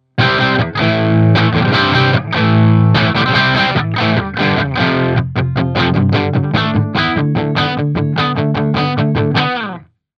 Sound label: rock; ampvergleich